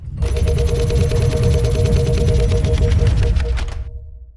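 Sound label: Ambience Partymode Crowd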